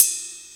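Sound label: open close hat; E-MU Formula 4000 Series Vol. 1; Hip Hop Nation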